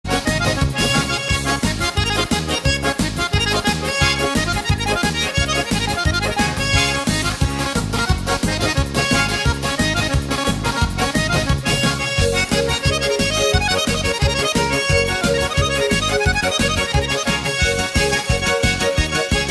muzyka garmoshka bystraya; zvuki k osennim prazdnikam

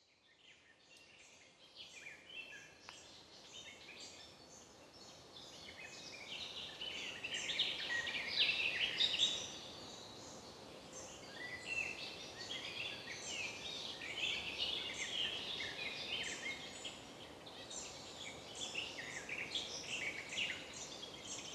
zvuki prirody rannyaya vesna; vesennii sbornik

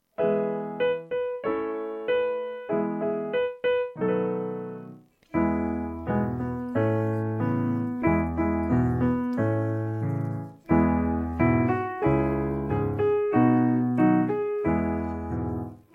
belyye gusi ga ga ga minus

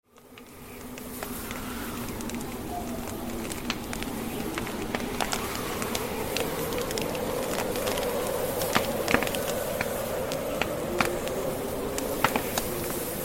audio effekt zvuk zimnei pogody goryashchego; prirodnyye zvuki zimy zimniye audioeffekty

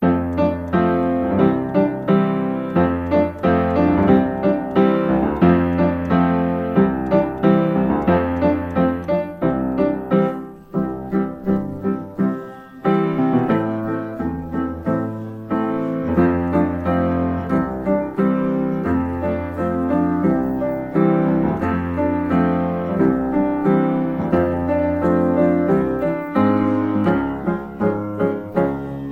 baraban minus

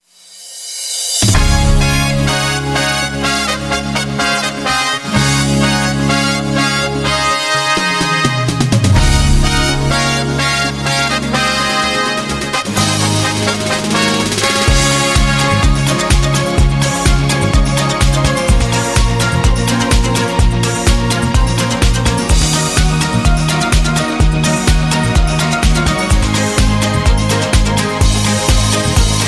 fanfary fon; det-skaya-olimpiada

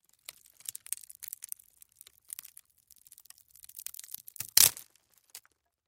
audio effekt khrust vetki; prirodnyye zvuki zimy zimniye audioeffekty

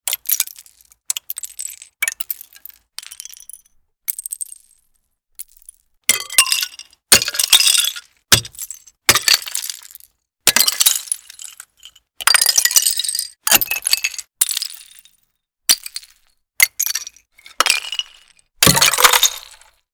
audio effekt khrust razbitykh sosulek, prirodnyye zvuki zimy; prirodnyye zvuki zimy zimniye audioeffekty

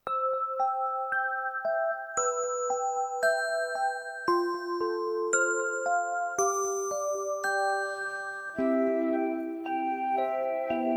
novogodnyaya pesnya minus

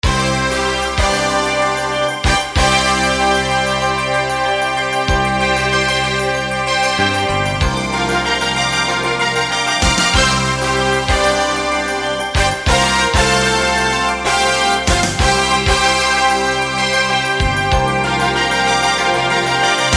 fanfary vstupleniye; zvuki k osennim prazdnikam; zvukovoye soprovozhdeniye

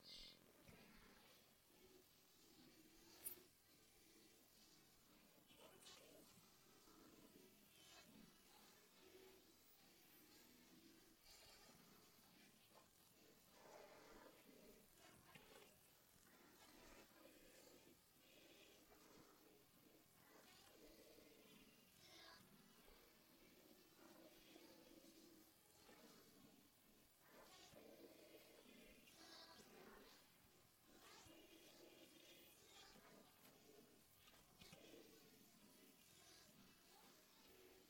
detskii ansambl bereza minus

